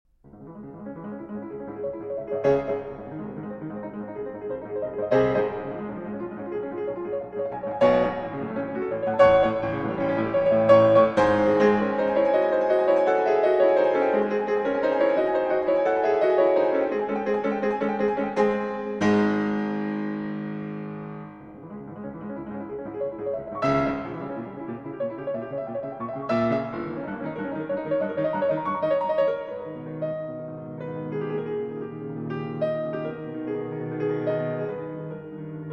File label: Piano Sonata In C Sharp Minor